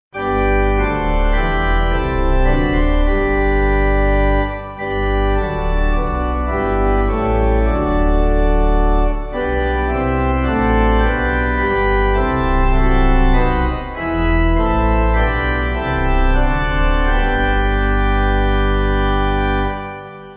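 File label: Pipe, Pipe Organ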